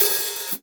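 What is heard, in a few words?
Long Open Closed Hat; E-Mu Proteus 2000; Multisamples; Digital Sound Factory Proteus 2000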